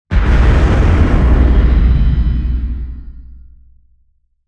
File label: distance titan laser fire; weapons; Stellaris